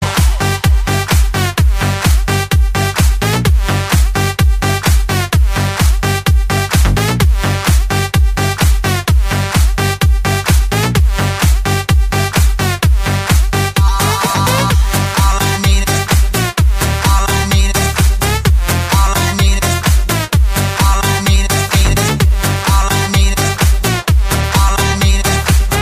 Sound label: Electro Club Music